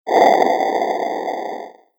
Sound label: Arcade Retro Fireball; Cartoon Sound Design Sound Effects; Cartoon / Game Sound Effect; Andrew Fleming